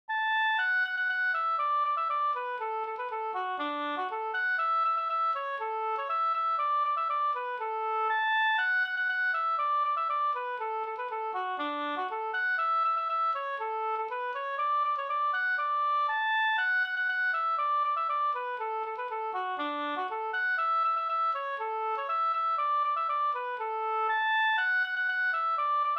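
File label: polka polkett D major; e Kwarnsjwi-Helmer Vb Ddur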